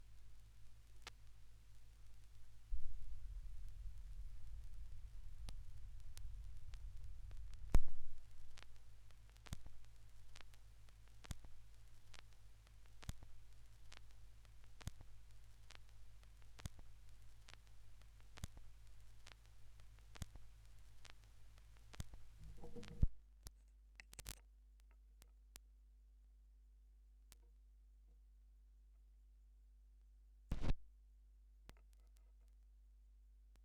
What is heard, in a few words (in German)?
schallplattengeraeusche